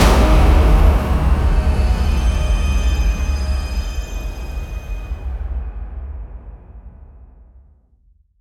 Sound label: thunderous shofar blasts; divine